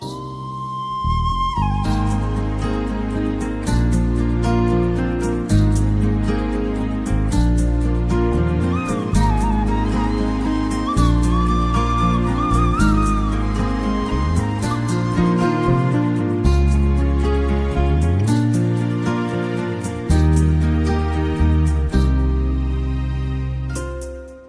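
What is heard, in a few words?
background music ringtone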